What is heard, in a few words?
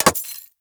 Bullet Impact Glass Large; Weapons